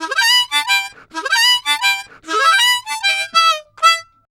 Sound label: Harm 098bpm A, Harmonica; Quazibeat - African Secret